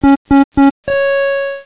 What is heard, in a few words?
lock on; quake2